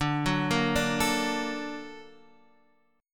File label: Bb Augmented Major 7th D